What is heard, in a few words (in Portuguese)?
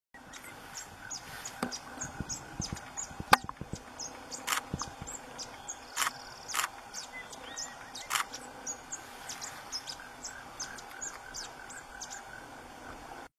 Canto do plushcap